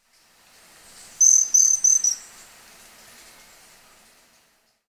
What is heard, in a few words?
Ochraceous piculet